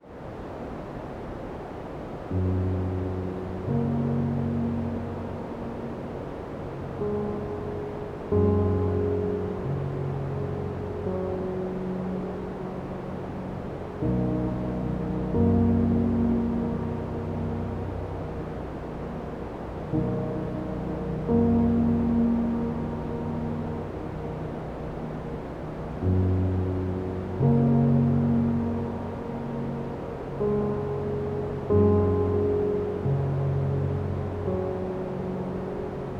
white noise sleeping music

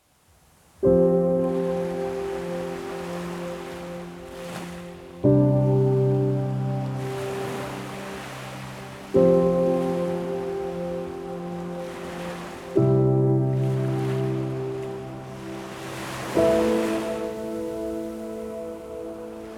piano, healing ocean waves

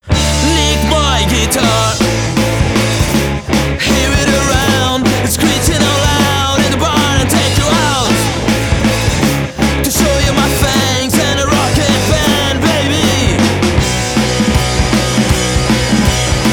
guitar

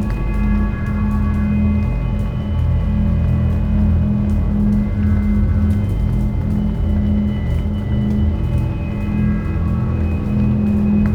desolated ambience

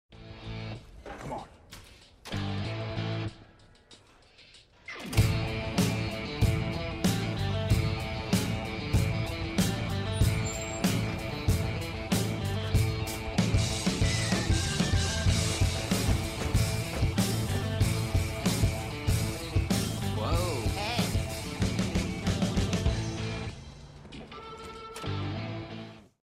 instrumental theme

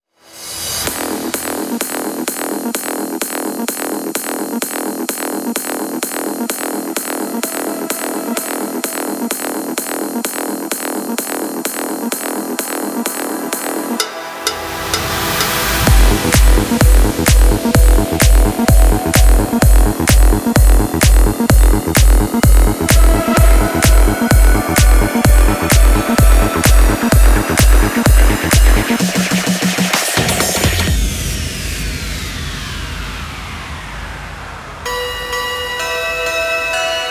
glockenspiel remix

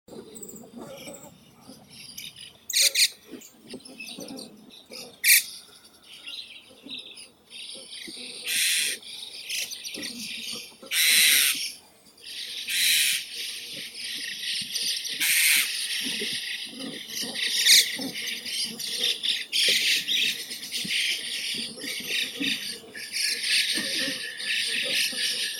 skvorets serii sturnus cineraceus

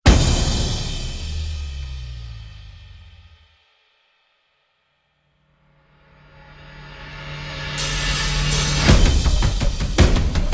drums

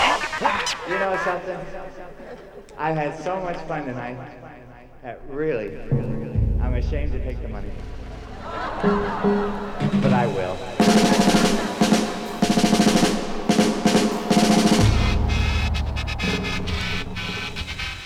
breakbeat

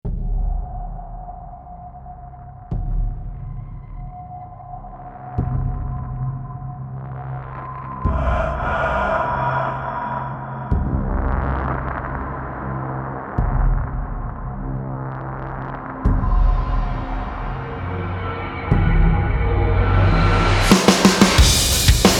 combat drums

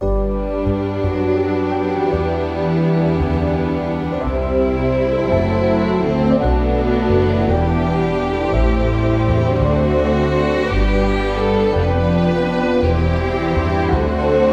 waltz strings version

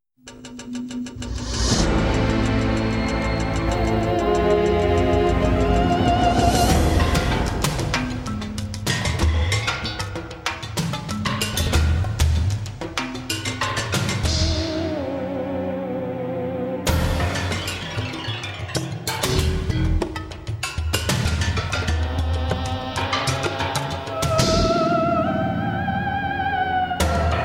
theremin

